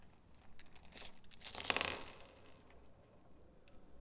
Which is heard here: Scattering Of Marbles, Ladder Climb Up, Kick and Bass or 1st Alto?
Scattering Of Marbles